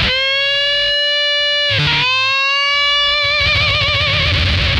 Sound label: Funk Guitar; 90 s Sample CDs; Zero G